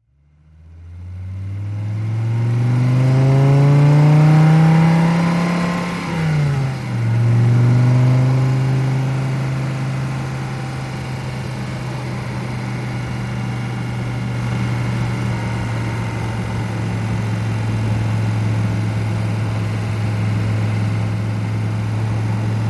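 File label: Driving At 45 Mph Slow, sports car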